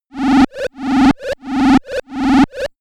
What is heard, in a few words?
synthetic ringing